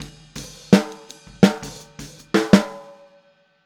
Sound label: Boogaloo Outro Snare, drums, 82 BPM